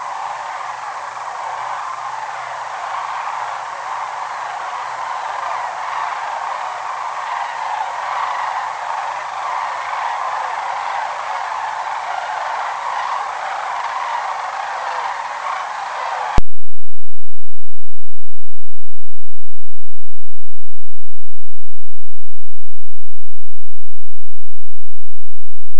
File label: sandhill cranes